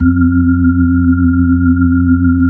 B-3 Chorus

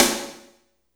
SNR TV SN, Snares; Roland - Rhythm Section; Snares 1; Sn Modules 1

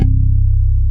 BAS; Best Service Gigapack 2

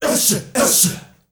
ESHEESHE R, GRP CHANT, Heart of Africa; Spectrasonics; Heart of Africa 1

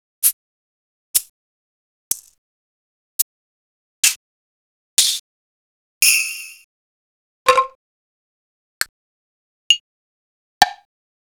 R8 Mixed Perc; X-Static Goldmine

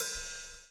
lash, backbeat

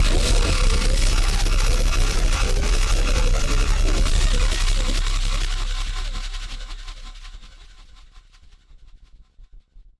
Battered Synth; malfunctioning synth samples

